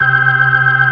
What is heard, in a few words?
B3 C 3-4